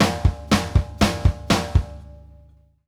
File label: tom + kick, drum fills; Sampleheads - New York City Drumworks VOL-1